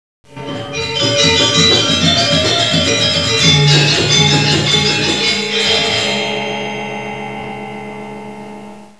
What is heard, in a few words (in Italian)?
suonerie musica ritmica